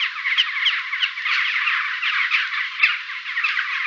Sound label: Campfire Birds; outdoor; Camping